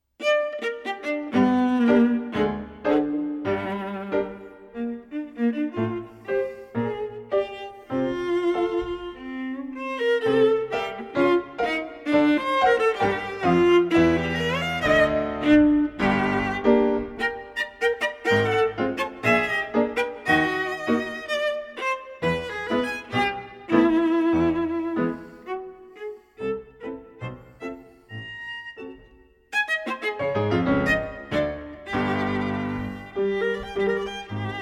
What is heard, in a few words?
Viola Klavier; D.Shostakovich; Praeludi; HRVATSKI GLAZBENI ZAVOD